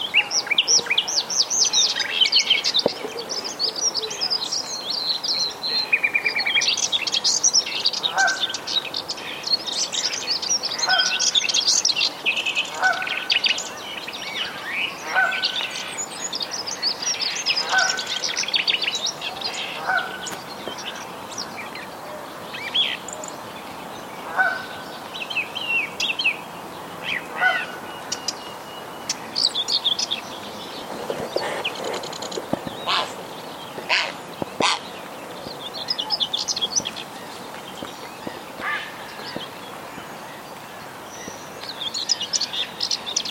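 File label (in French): succession oiseaux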